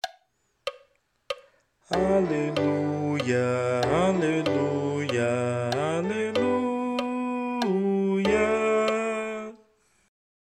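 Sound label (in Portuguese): Aclamacao coro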